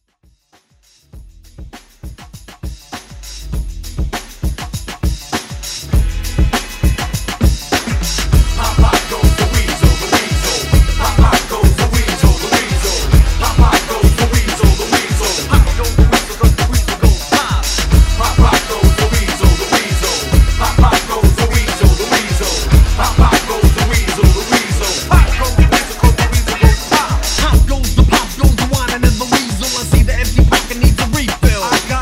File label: Pop Goes The Weasel Old School Redrum